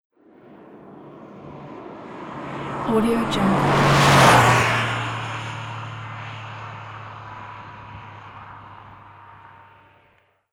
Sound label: car passing by